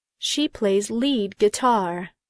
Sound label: guitar; She plays lead guitar.